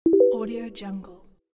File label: message